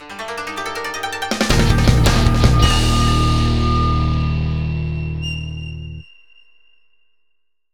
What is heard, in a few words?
BGM Win; Naruto: Clash of Ninja Revolution 2; Wii